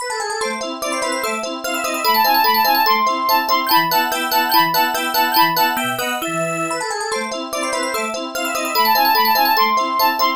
bowed glass

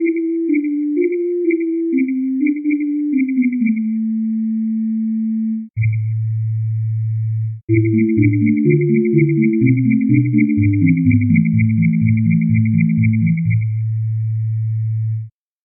whistle

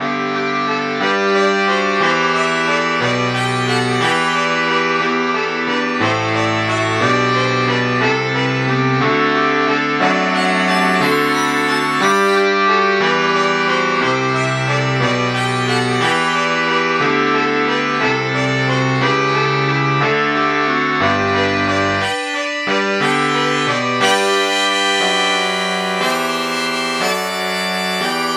baritone sax

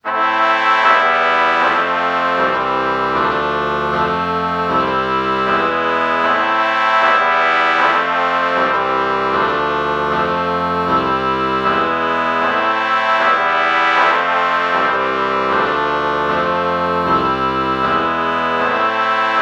oboe trumpet lpc true chord morph

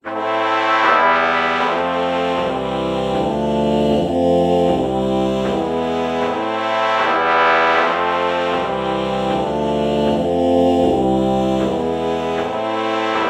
oh trumpet chord morph

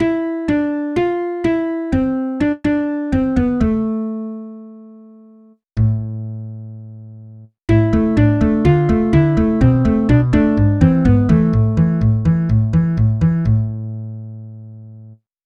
acoustic bass

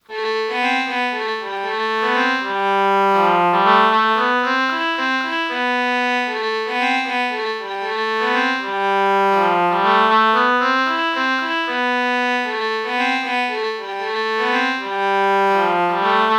oboe violin melody morph low

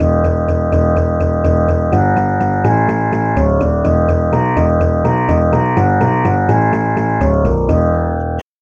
sitar bass

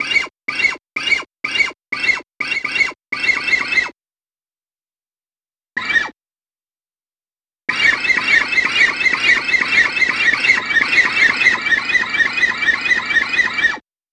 fret noise

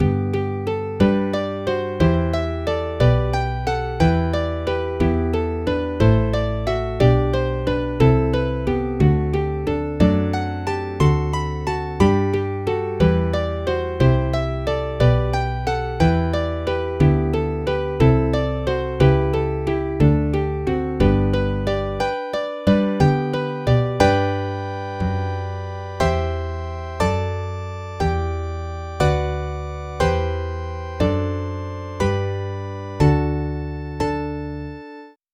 acoustic bass bach samples

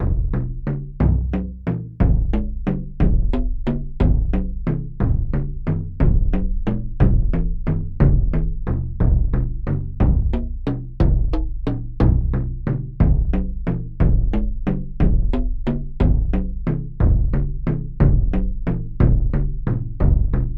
taiko drum

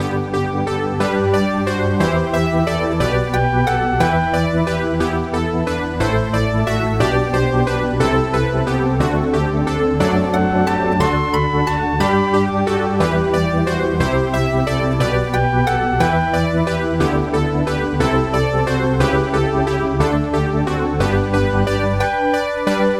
chiffer lead

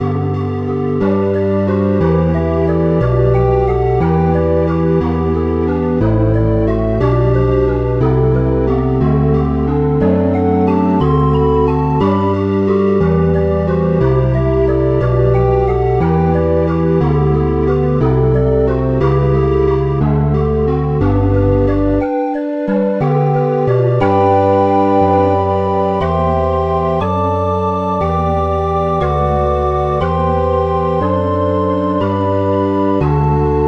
ocarina

